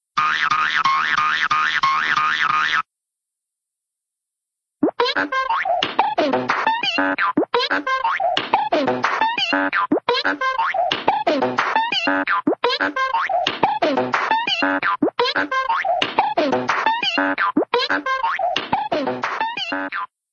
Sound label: hooping sproings; cartoon; pixie & dixie; hannah-barbera